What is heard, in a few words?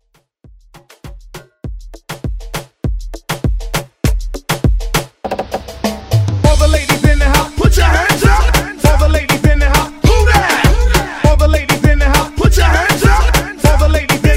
Reggaeton Hype Edit